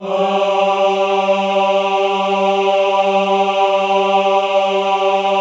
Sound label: AHH G2, Classical Choir; Best Service; Extended Classical Choir; AHH MIX